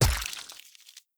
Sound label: Health Cocoon Break